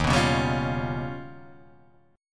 D sharp Augmented 9th D sharp Guitar Half Step Down